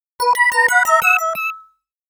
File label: Insert Coin; Darius MD Sound World 2021